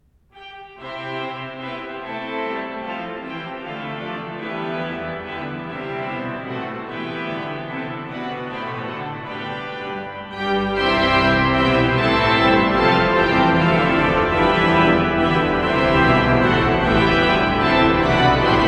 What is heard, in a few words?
Menuet gothique, orgue; valais; Brig; Léon Boëllmann